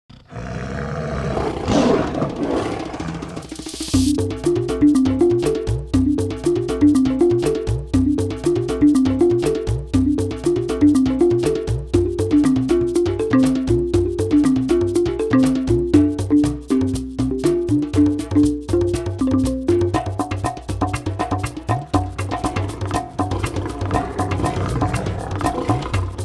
African Style; World

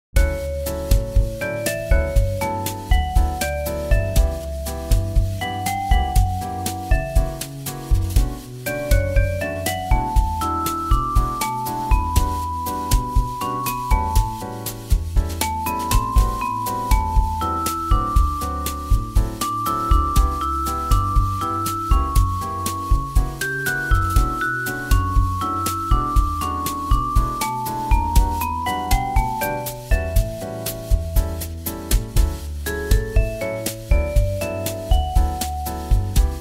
Bossanova; KIDS 귀여운

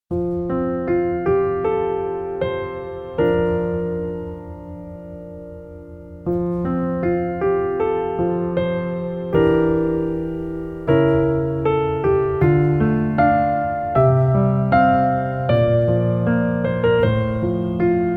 March; 감성 BG

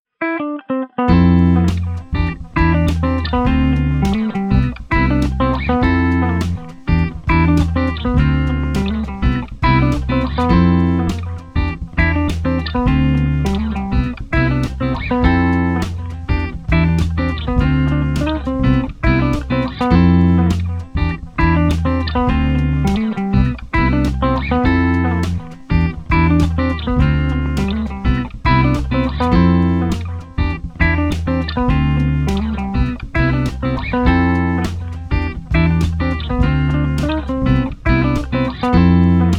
Woodclock, Bright BG

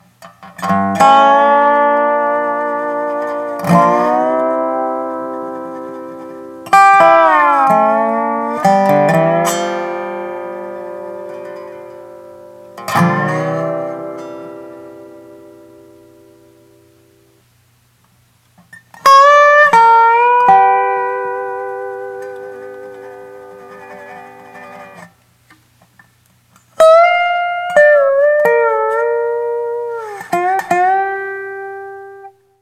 Dobro FX

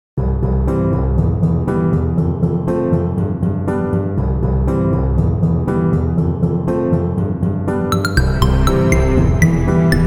Birds

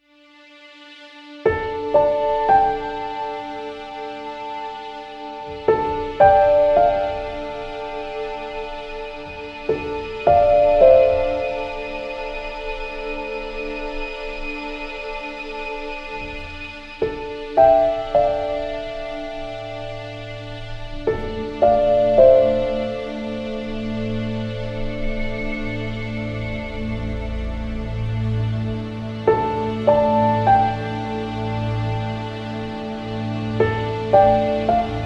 Choose, 감성

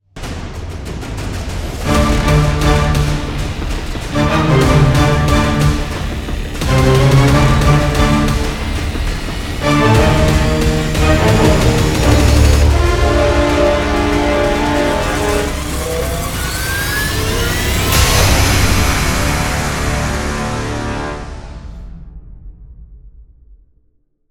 Counterattack, Tension Fast

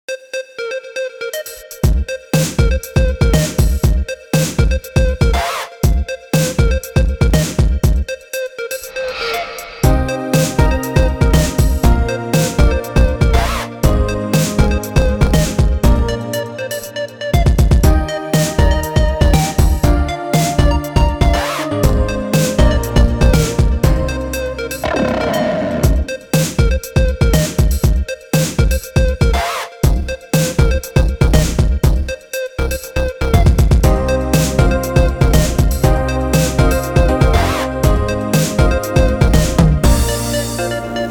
Electronic, EDM

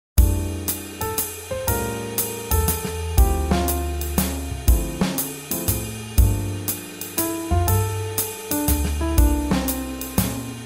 Swing Jazz

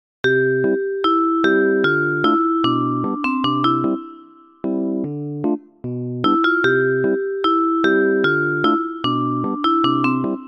Newage; KIDS 귀여운

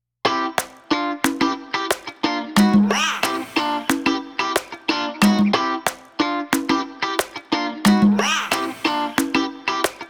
Bright Latin

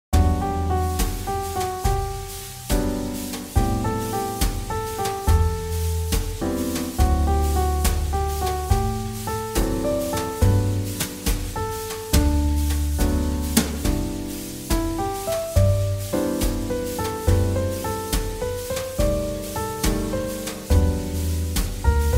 JazzBallad; 잔잔

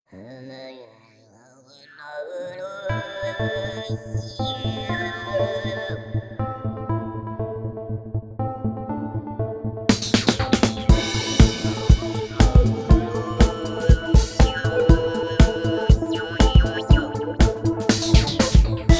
Ethnic Electronica, EDM 리듬

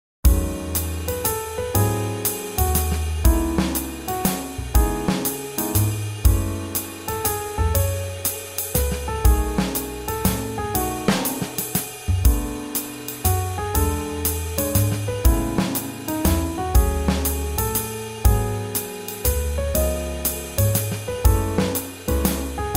Swing Jazz

